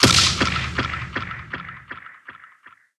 SPELLS Stun; Puzzle Chronicles; PS3; PSP; X360

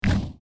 Lava Destroying; my cute commissar 2020 gamerip

